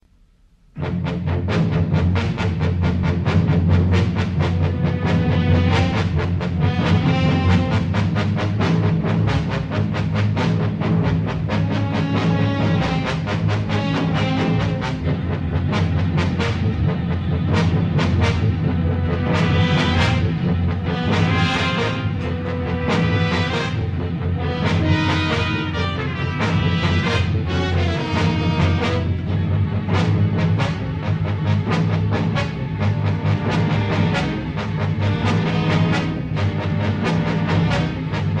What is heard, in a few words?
Heavy Agitato